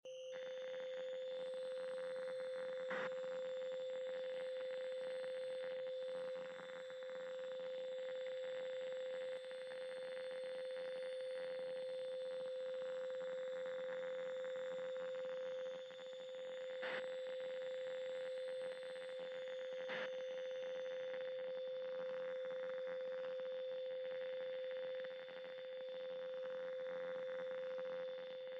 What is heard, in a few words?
Breaker Room Ambience (Powered Down); Five Nights at Freddy's; FNAF Sister Location